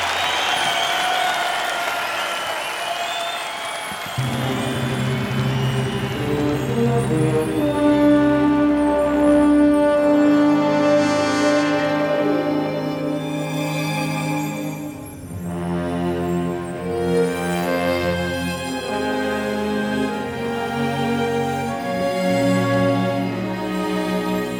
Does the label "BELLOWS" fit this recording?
No